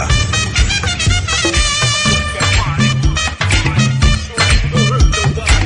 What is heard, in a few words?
New Trumpet